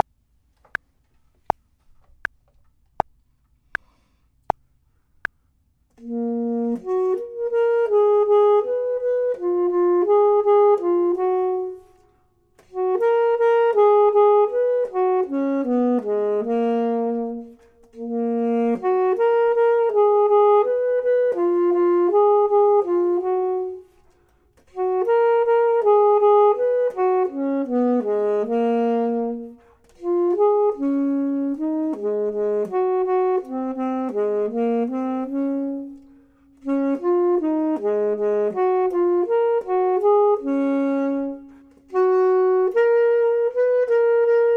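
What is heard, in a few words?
bottom, Saxophone and Clarinet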